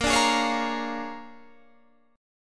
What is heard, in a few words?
A sharp 7th Sharp 9th, guitar chord; x,x,20,19,20,20,19,19; down